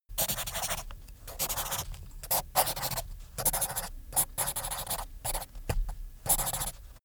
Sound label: pen on paper